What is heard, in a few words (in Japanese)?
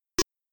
タイムボーナス加算音